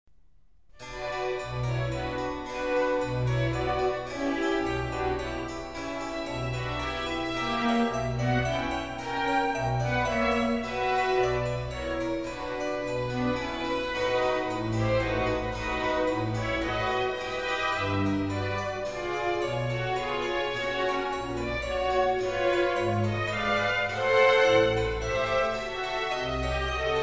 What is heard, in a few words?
Harpsichord Concerto in E major, Sicilia; No. 2; BWV 1053; Siciliano